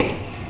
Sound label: footstep